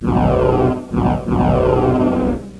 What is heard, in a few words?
ERROR; 999wavs